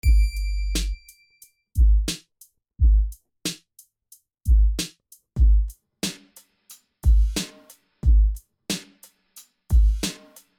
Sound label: beat